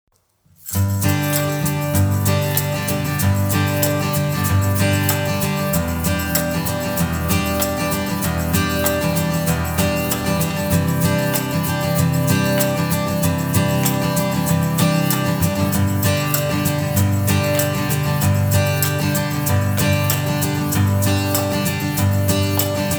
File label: Acoustic Guitar Percussion; AEA N13